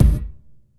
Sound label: Gated Kick, Gated Reverb; Univerb; musicradar